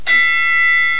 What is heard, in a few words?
clang